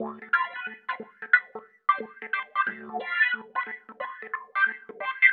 Wah Wah, Organ, Loops, Effected; Sonic Foundry (Sony Creative Software); Joe Vitale Organ Donor

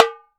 TAMBORI OP, BRAZIL

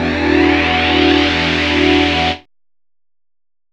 DR. LOOP, TRANCE; X-Static Goldmine 3

